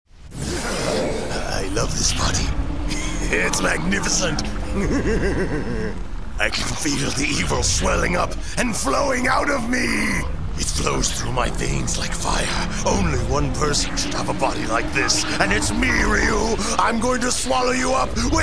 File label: moan; sfalpha